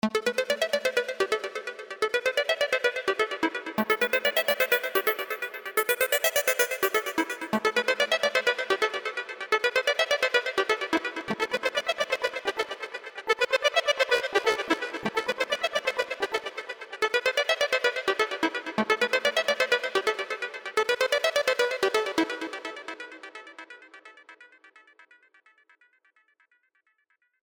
Melodic Tech Voices Spectral Gates; Vengeance Sound Avenger 2

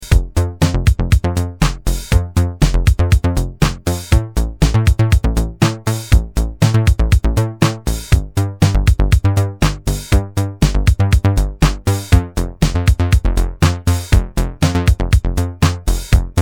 tr 9 + Pro one

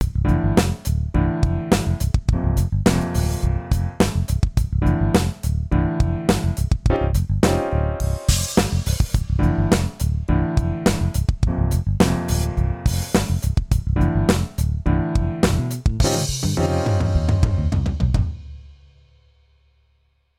Heritage Audio Successor heavy fast 160Hz

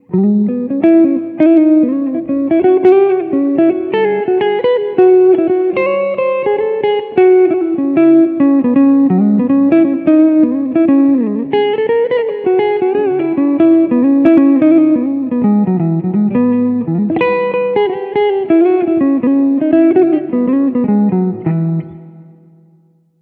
Jazzy

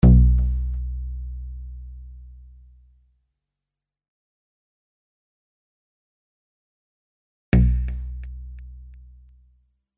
BA Nice Round; Vengeance Sound Avenger 2; Melodic Tech Voices 1

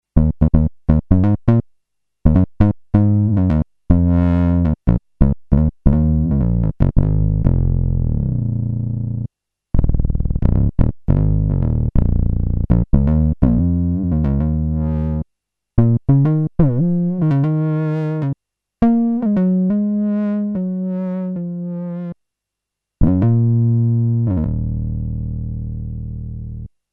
vintage bass

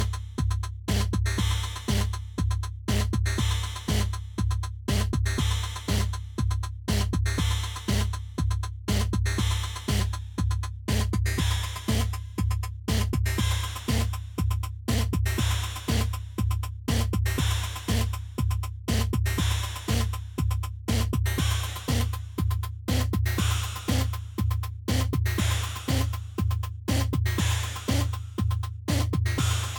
SpazeDrum

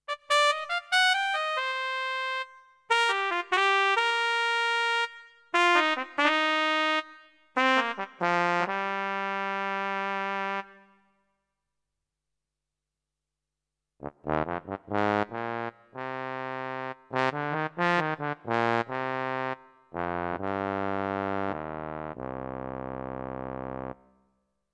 brass

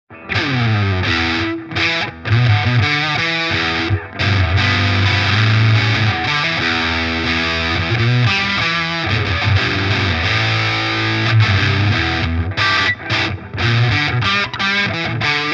max. Bright Switch on; JHS Pedals Berkeley Bite

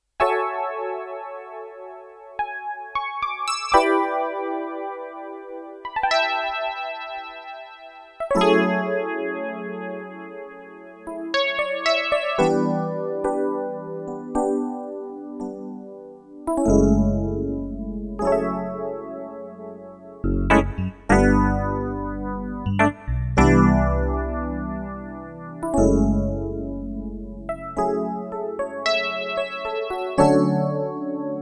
EPiano; YDP141

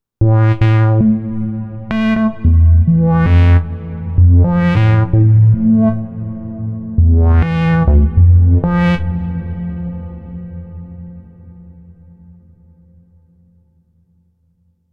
PC3-A8 Dark Wobbles

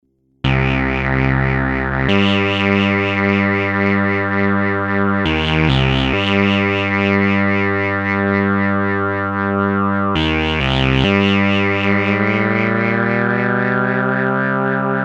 Multi Oszi

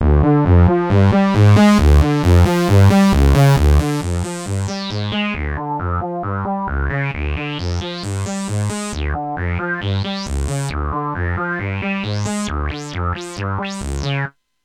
SEM Moog

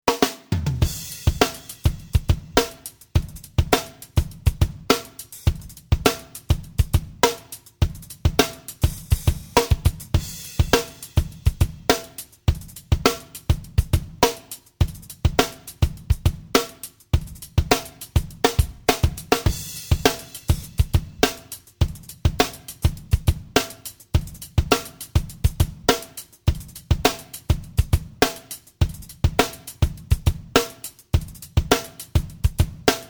Drums eq

